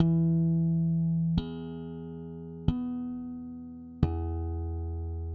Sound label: Harmonic Riff, Bass; Bass X